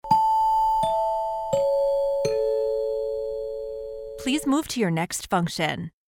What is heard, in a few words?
four chimes descending please move to your next function